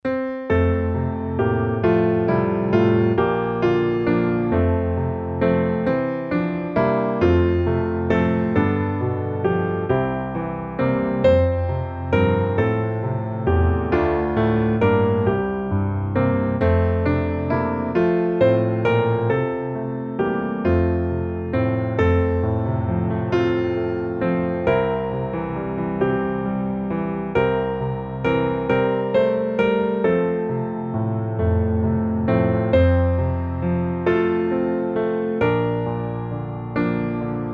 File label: piano